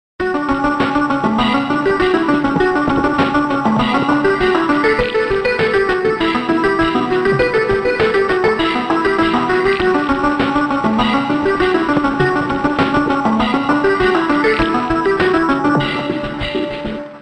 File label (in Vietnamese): khong loi xiaomi poco m6